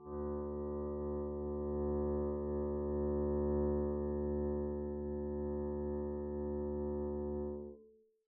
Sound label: arco d2 pp down, double bass